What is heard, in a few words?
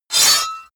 sword cut hard clank